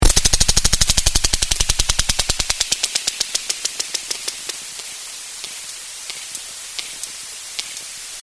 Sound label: sperm whale clicks